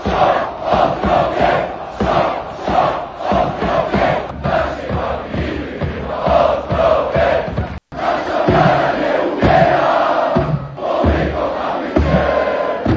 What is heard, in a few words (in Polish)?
kibice; Resovia Rzeszów; KSZO